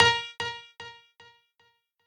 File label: piano